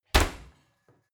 oven close baking sheet ringing hard

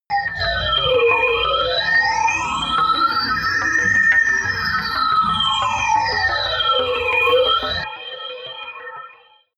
hydraulic wind

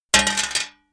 metal plate thin drop

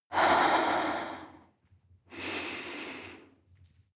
breath female mouth out nose in

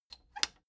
plastic button latch squeak click disable slow

